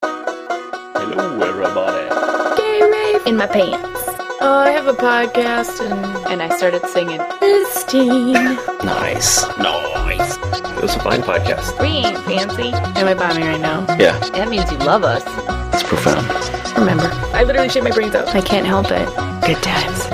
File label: banjo intro